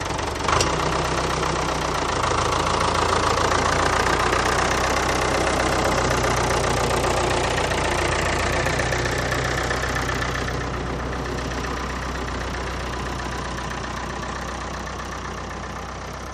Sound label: Tractor Awy Pull Away